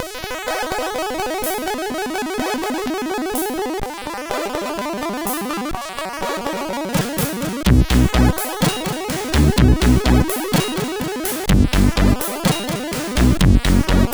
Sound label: sid, CSG8580